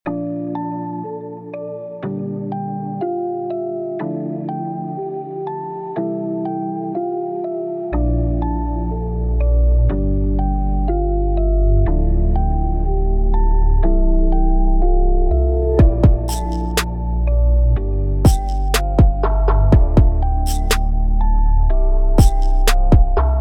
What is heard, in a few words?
beat